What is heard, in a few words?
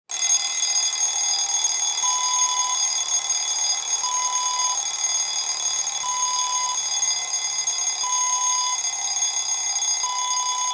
alarm clock